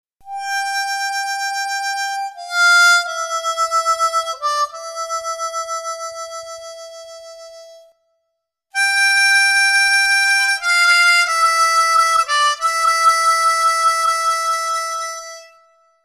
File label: Effects, harmonica